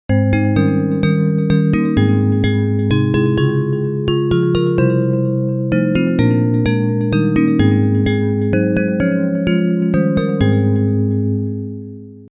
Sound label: Bells